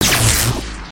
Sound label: Plas Blast